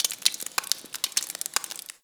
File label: Ice Thaw Drip; Ambience; Warframe